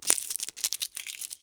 Masking Tape on Fingers; Office Supplies; 1001 Sound Effects

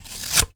Cutting Cardboard with Scissors; Office Supplies

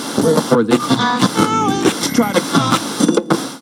FM Radio Tune; Communications

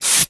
Aerosol Can; Bathroom

